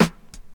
snare drum e key, Royality free acoustic snare tuned to the E note. Loudest frequency: 1076Hz